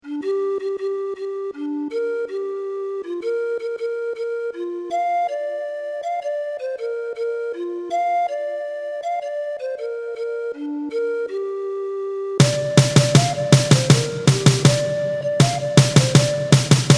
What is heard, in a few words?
PERC; Mariposas de colores